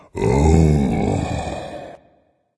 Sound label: content dragon; fafnir